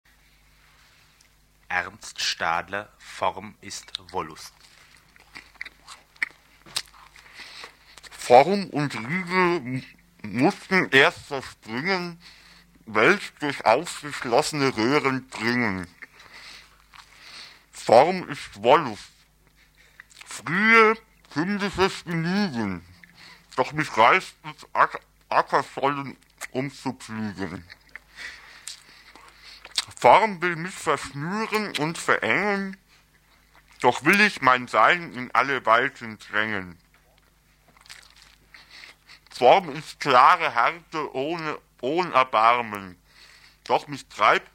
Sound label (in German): sprache orch jazz